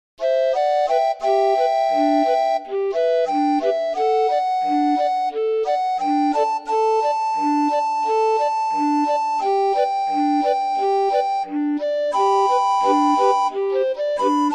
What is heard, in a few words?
orgue barbarie